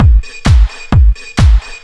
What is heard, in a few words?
techno, DRUMLOOPS; Techno Trance; Ueberschall; Techno Trance Essentials